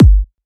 Techno Kick

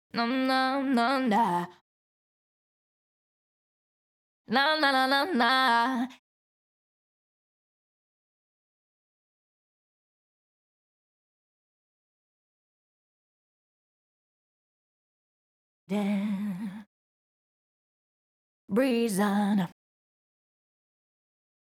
CLEAN DUP R (AbMaj), Pop Vocals, Acapellas; Ghosthack; Ultimate Pop Vocals; Heart Break

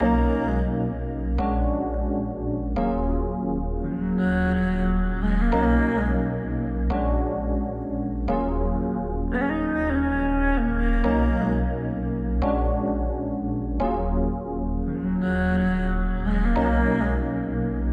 87 BPM D# Min, Hip Hop Melodies, R&B; Around The World

